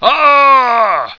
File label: ahh, pain heroic